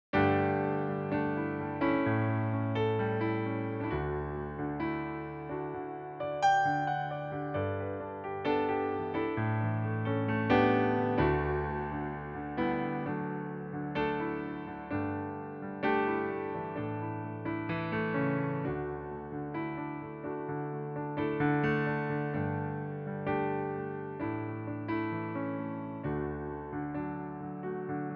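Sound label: piano playback D major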